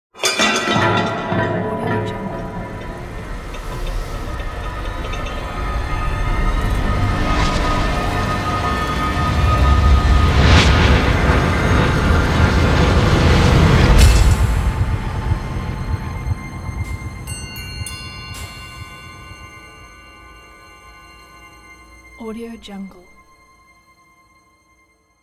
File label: trailer cinematic impakt